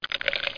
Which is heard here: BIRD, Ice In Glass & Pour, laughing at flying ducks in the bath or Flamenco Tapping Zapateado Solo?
Ice In Glass & Pour